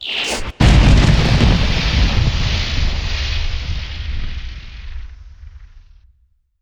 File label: portal spawn; nihilanth